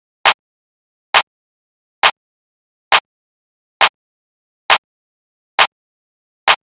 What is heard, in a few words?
Clap Loop 135, Techno; Ghosthack; Techno Sounds 2025